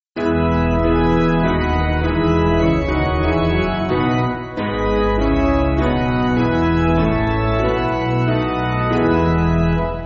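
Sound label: Piano, Free Music Backing Files for Churches; O Lamb of God; Decius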